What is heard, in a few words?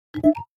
confirmation, Nokia 808 PureView